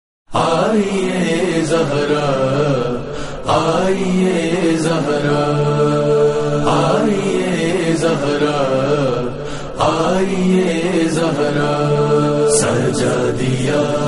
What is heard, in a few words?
Noha; Azakhana; Aghaz e Muharram Nohay